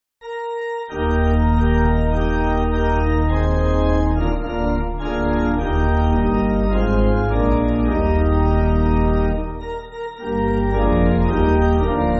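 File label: My Heart Is Resting, pipe organ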